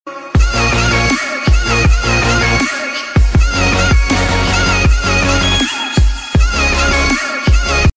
popular outro

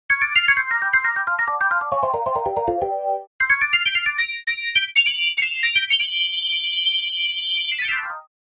Hammond B3 Mode B3 High Slow; Master Hammond B3; Syntheway

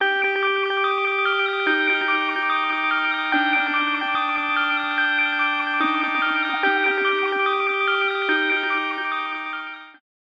Distant Stars; Waverator Spectra

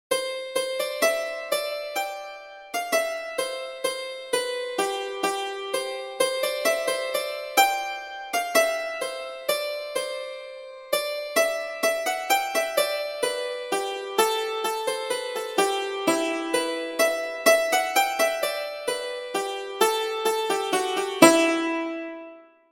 Harp Hammered Dulcimer; Chordophonet; Syntheway

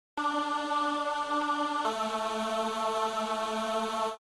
Angel Vox; Syntheway

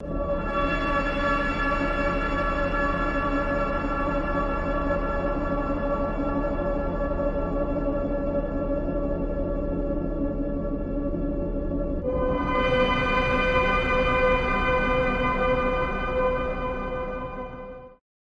Oscillator ATMO Oneiric Ambient; Alphatron

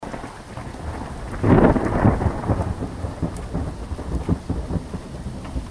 thunderstorm

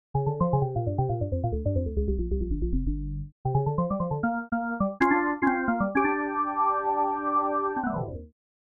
Hammond B3 Blues; Master Hammond B3; Syntheway

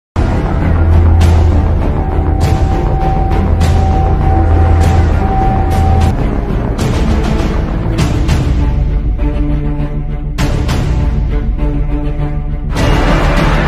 dramatic tone

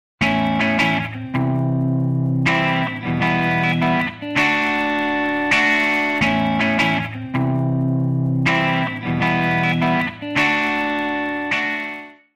Overdrive Wet; Overdriverb; syntheway

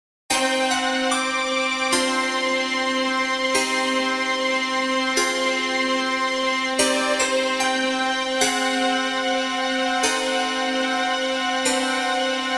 Harpsichord Flute; Final Fantasy VIII; Syntheway

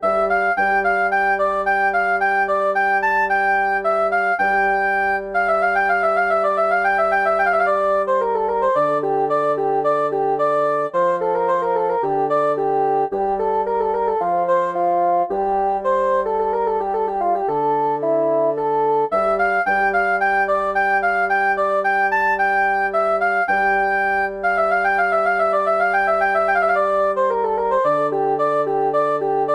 Bassoon; DAL Flute; Woodwinds

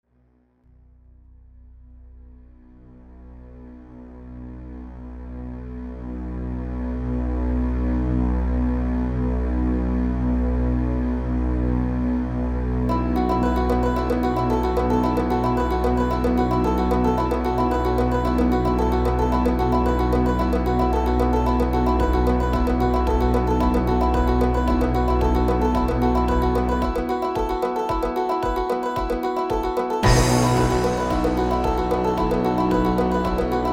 Mandolin, Strings, Harp, Brass, Piano; VST; Banjodoline; Chodophonet; Syntheway

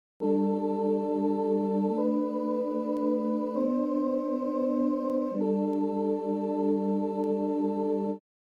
Synth Vox; Syntheway

